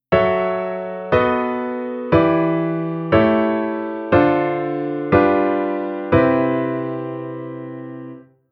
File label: Chord Ex Dom